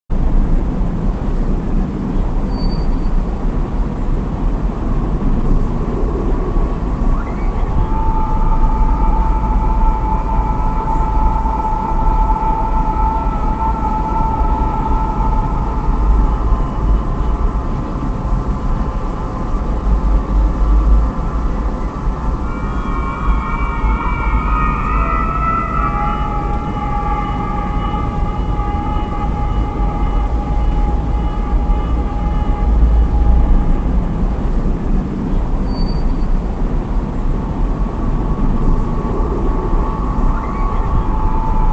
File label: roof ambience; Milk outside a bag of milk... OST (2021)